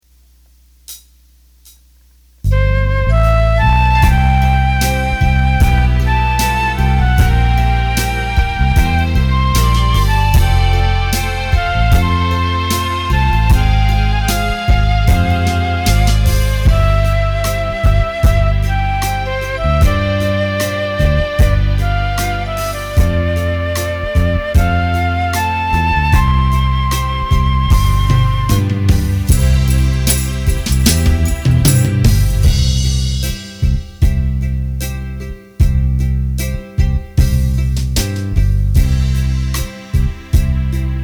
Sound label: Ballad